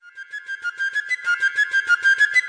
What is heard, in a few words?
192 backwards flutey; WORLD LOOPS